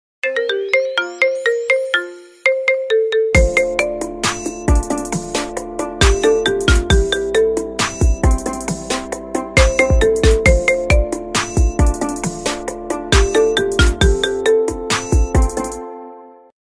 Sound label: marimba